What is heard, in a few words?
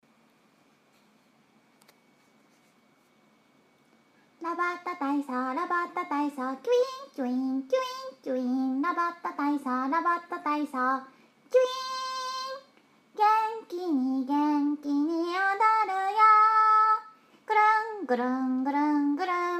vocal